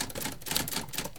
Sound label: type